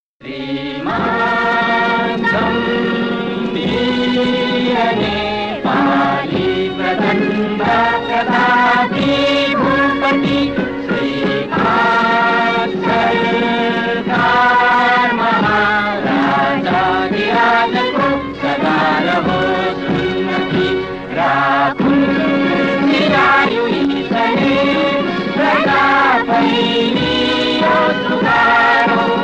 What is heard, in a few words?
Nepali Anthem